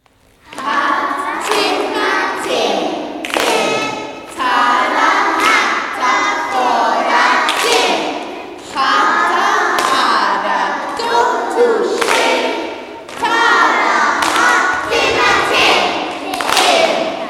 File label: asian songbook the parrot song